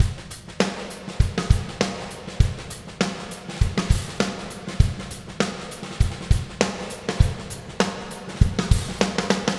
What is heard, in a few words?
With Dynamic Drum Reverb EQ